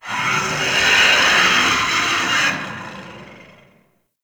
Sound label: Arcade Dino Laser Roar; Ambience; Props; Warframe; Nineteen Ninety Nine